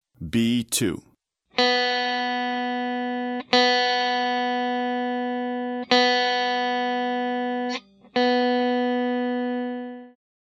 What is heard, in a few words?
B string, guitar